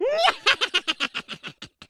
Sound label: laugh, French; speech